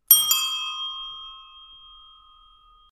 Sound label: ding dong